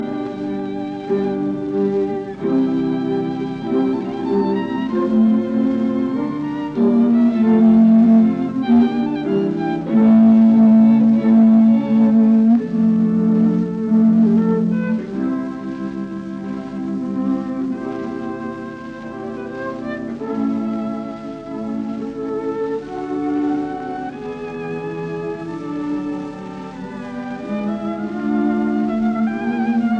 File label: String Sextet in B flat major Andante ma moderato; Brahms; Op 18; Adolf Busch